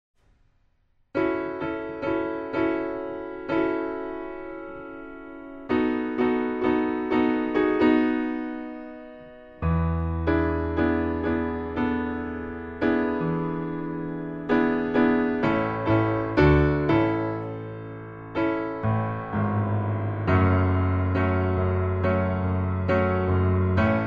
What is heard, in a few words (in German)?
Klavier